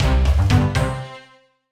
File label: Music Start; Castle Smasher